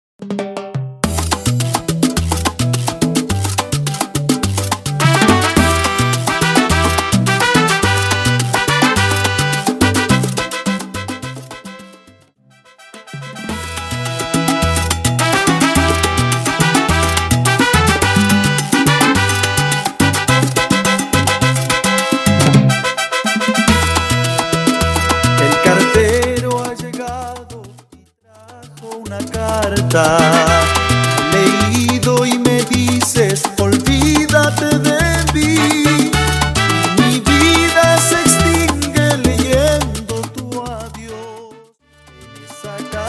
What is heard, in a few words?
Transition Salsa To Cumbia 106 Bpm